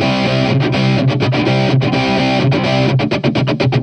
Roxy, break beat

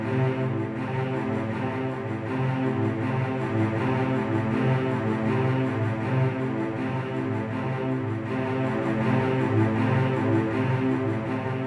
Cello stacc; Сэмпл виолончели; Cello (cтаккато); film music; Strings; виолончель; Movie Score; 80BPM; Музыка для фильмов

